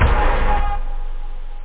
orch; da capo vol1; instruments; stabs

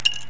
BUG DROP; Bugs

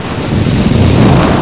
flames; Game Head 10

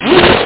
MISSILE

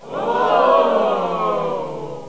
Fast Ohh; How Would You Survive (1995); Grolier

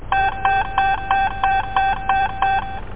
time alert; WormWars

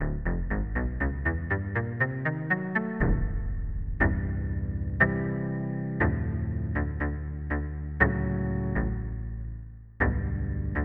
Busy bass

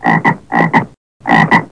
frog

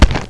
No Push; Blobbo 1.0.2 Lite

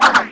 helm retn; lomax

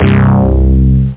Bass Acid

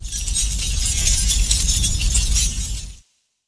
Just Crystal

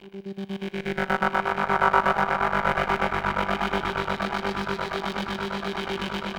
synth; Evolution

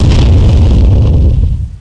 Bomb Explosion Close; A-10 Cuba; PC Gamer 40

